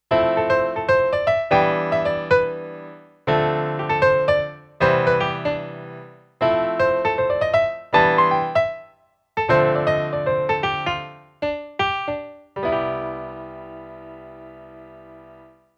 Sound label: Bright Piano